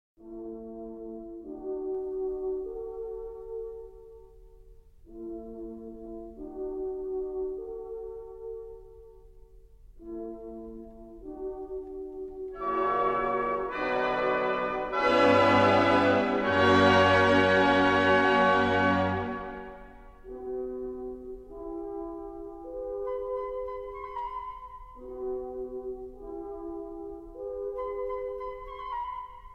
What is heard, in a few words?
Concerto No. 2 in B Minor for Violin and Orchestra